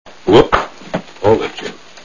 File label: whoop; THE NIGHT OF MONTEZUMA'S HORDES; season3